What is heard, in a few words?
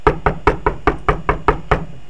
door knock